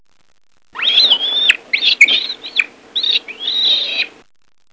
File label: Monkey Squeek